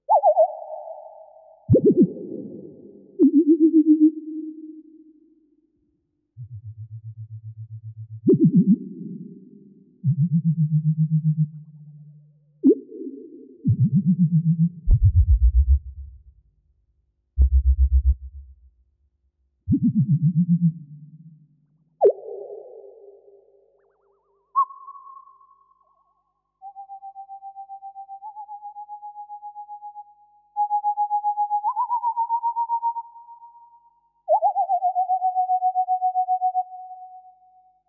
SCI-FI

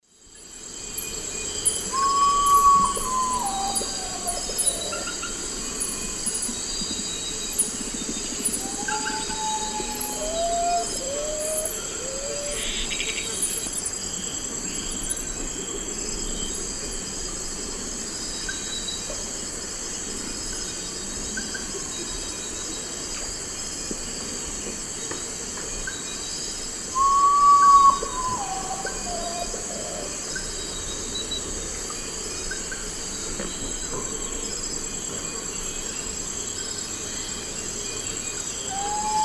Nyctibius griseus, song; S. America; Eastern Lowlands

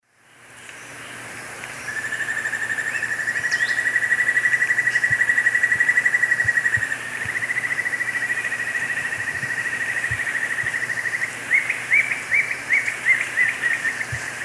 Notharchus hyperrhynchus, duet; S. America; Eastern Lowlands

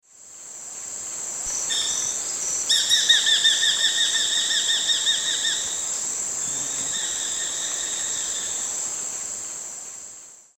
Falco rufigularis, calls; S. America; Eastern Lowlands

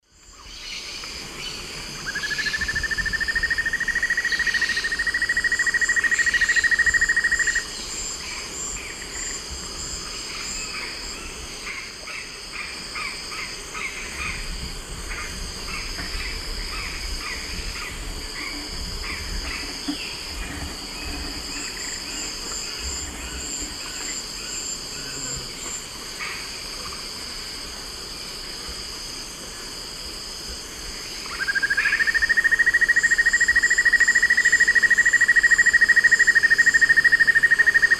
Notharchus hyperrhynchus, song; S. America; Eastern Lowlands

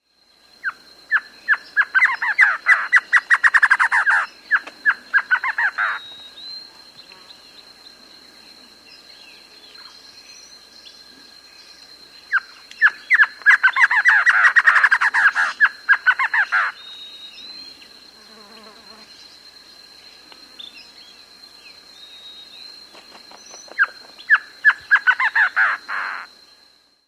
Silvery-Cheeked Antshrike; S. America

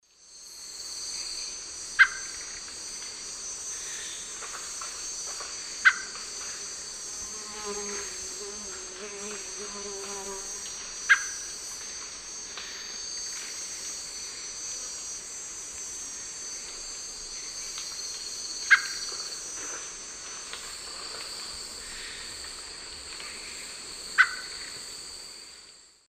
Turdus albicollis, calls; S. America; Eastern Lowlands

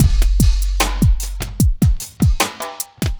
hiphop

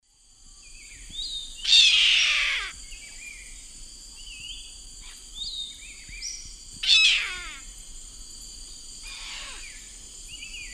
Milvago chimachima, calls; S. America; Eastern Lowlands

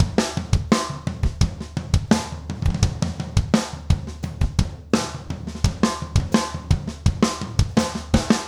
rock 85

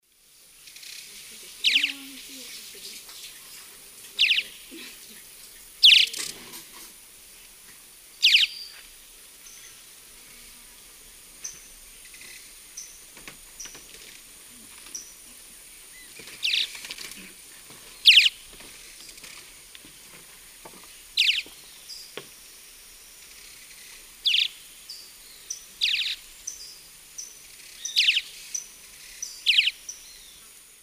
Progne chalybea, flight calls; S. America; Eastern Lowlands

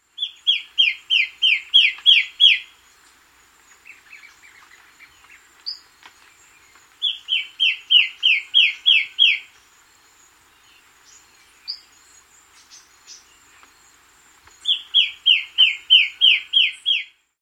Stub-Tailed Antbird; S. America

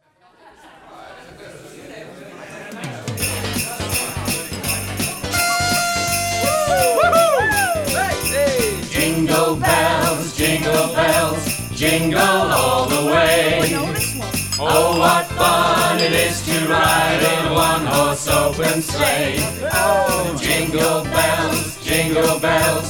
Jingle Bells, sing-a-long Christmas party; non-stop

